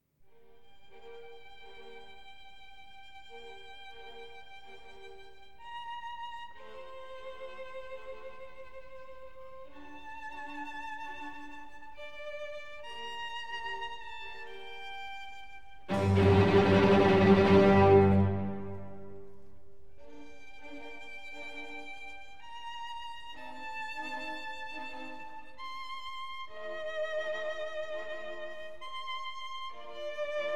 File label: Violin Concerto in G Minor; Op. 8, No. 2, RV 315 L'estate